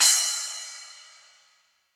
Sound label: Splash 10 Old School, Cymbal; Drum Hits; Standard Hits; Session Drums Studio